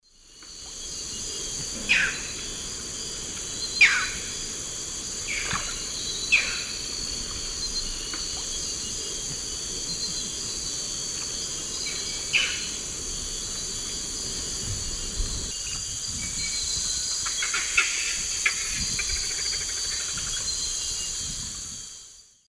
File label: Butorides striata, calls; S. America; Eastern Lowlands